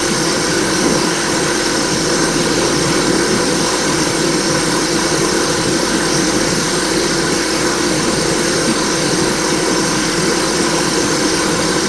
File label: blender